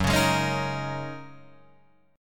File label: Gb 6th Add 9th, Guitar Drop D